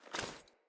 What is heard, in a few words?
Foley Run Default, Default Clothing; Player